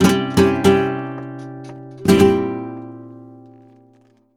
Flamenco, Guitar, Bulerias, c

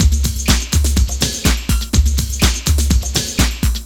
LOOP 124

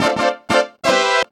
Brassy, Funk Keys; Sonic Foundry (Sony Creative Software); Synchro Funk

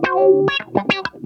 LICK, Funky GtrLicks; E-MU Formula 4000 Series Vol. 1; Hip Hop Nation

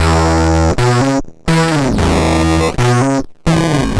Guitar Loop, 120BPM

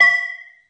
IND; Zero G; Man Machine

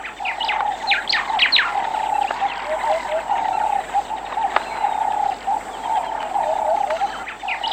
ATM SWAMP, Swamp Atmosp; Roland - LA Composer vol. 2

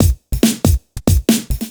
Breakbeat 140 BPM; Vengeance - Essential Clubsounds Vol.3; Loops 140 BPM

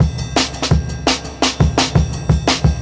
Cavern, Jungle Breaks; Original Jungle Breaks

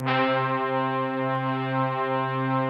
big brass; Greytsounds

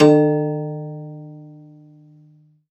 Rubbery Piano, prepared piano; Total Piano

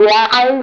WAHS FOR F3; PlatinumPhattCD1; E-MU Producer Series Vol. 8 – Platinum Phatt; Best of Phatt

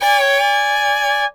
FIDDLE FX; Sampleheads; Whole Lotta Country 1 & 2

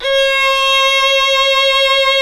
Synclavier Strings; ILIO

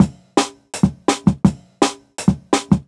Jungle Breaks

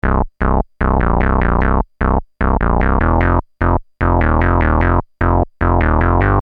Syn-Bass m Cm, Techno Synth Loops, 150bpm; Sonic Foundry (Sony Creative Software); Signals I

